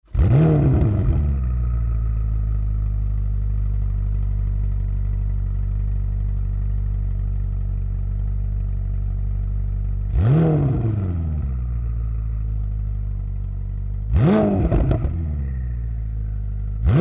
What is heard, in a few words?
FERRARI F8 TRIBUTO; EVOLUTION LINE